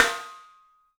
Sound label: MARCH, 3D Drums; 3D Sound; MARCH XTREM